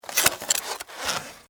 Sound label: Styrofoam Open, Foley, Styrofoam, Container, Open; Squeaks (Sound Effects:Objects); BlastWave